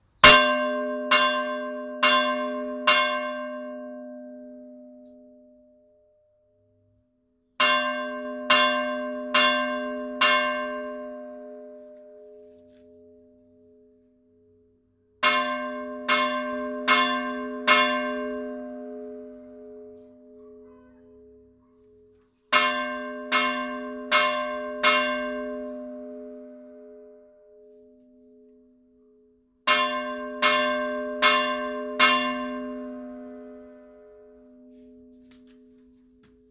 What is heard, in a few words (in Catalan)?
campana